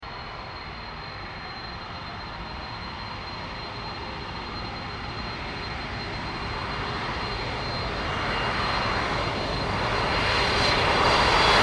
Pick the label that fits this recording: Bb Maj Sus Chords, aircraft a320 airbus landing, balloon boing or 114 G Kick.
aircraft a320 airbus landing